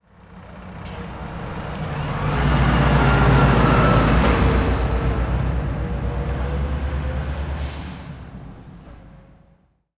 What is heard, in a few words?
truck turning at intersection; transportation